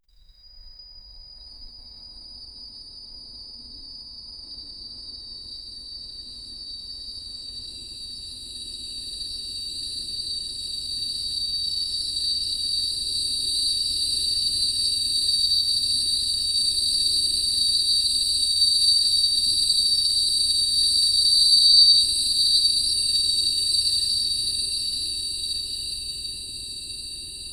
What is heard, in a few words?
soft icy wind with floati; magical